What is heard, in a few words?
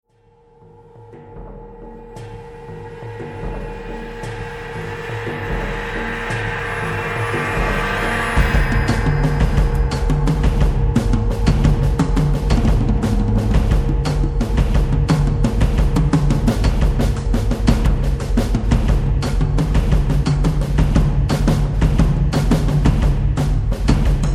District Drums; TOURNEY